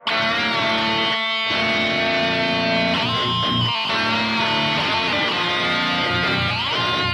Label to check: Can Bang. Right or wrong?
Wrong